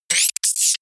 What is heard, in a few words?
glitch short; zaps-buzzes